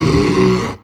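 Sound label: brute attack, creature; GammaAge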